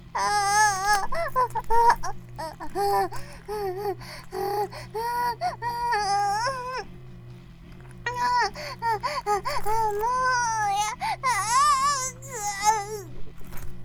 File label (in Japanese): バイブ放置; イクたびにわけて